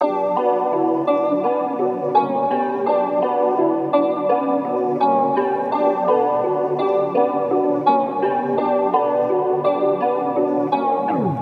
guitar loop 168 Bm; nightrider

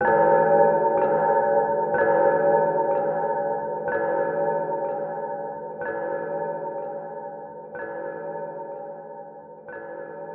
62 G Water Chimes; Adrift

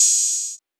Hat Open

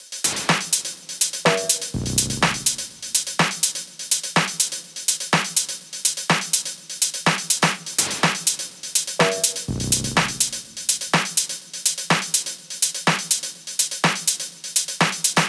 124 Drums Top

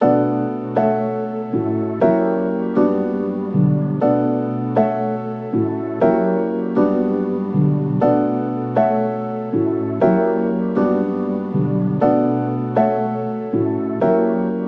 120 piano loop sentimental chords, Bmin

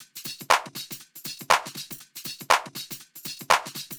Drum Loops 120

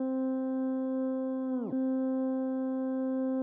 Synth Some would call this a key

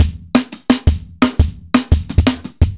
172 drum loop trashy; beetje trashy

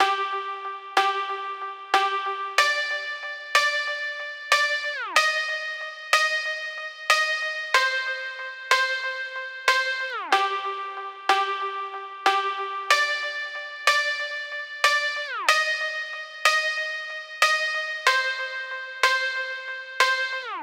Xylophone D-maj 93 BPM

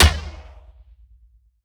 Dirty Percussion

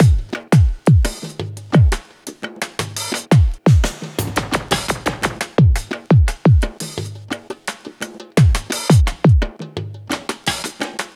172 Fmn Intro Beat; Ankarra

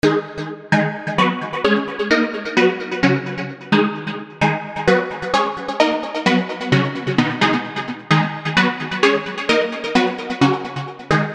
174 Gb Synth; The Pinnacle

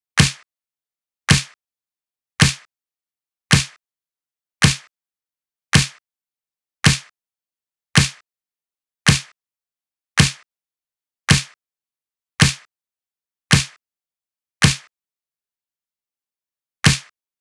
Snare, C

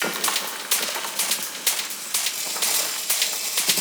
126 RHYTHMIC TOP LP

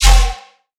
bass, D; Flyby

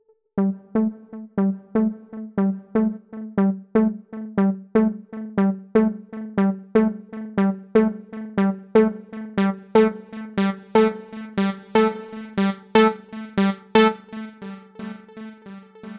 Synth, 120, A

